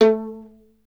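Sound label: STR violin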